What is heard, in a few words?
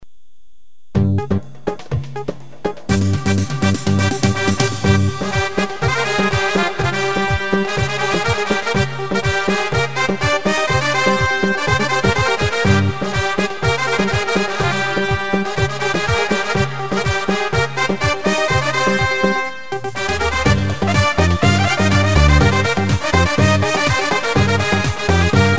brass; gw-8